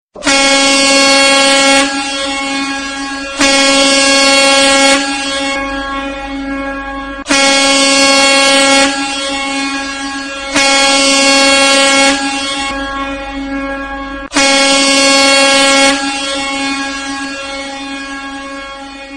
Ship Horn

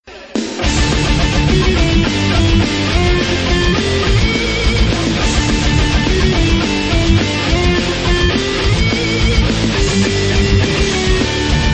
Cupcakes Metal

